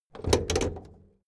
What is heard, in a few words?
car camaro open hood